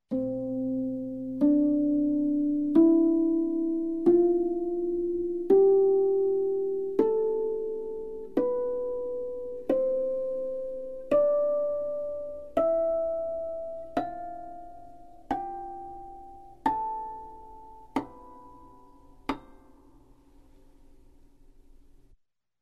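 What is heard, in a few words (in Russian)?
звук арфы гармоническая гамма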